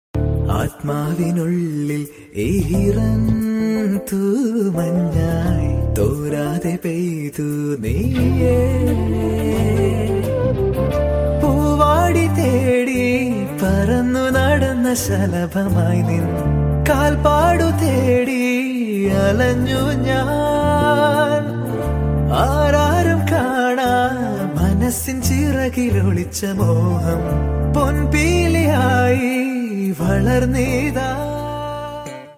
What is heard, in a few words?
Flute Ringtone